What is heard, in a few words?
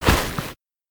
Footsteps Mountain boots Grass Mono; Backrooms 2D